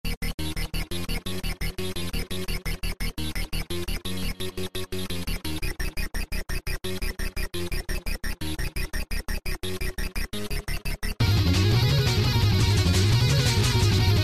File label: funky guitar